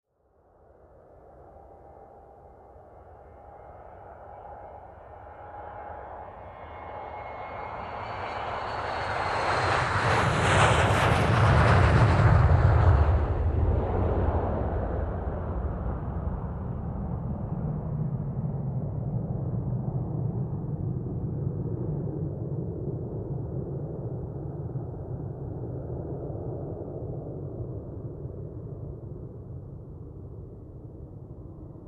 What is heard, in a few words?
Airliner Distant Lifting Off Whirring Sound